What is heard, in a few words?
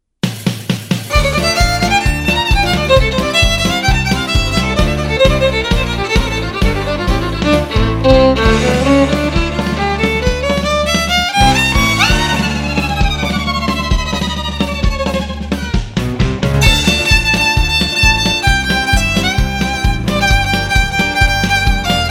Fiddle